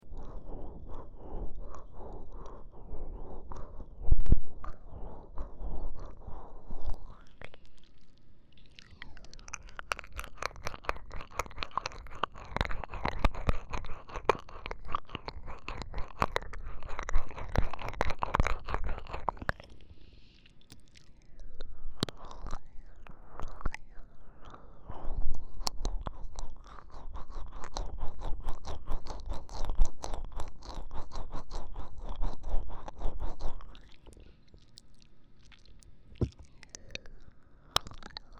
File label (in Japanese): 耳奥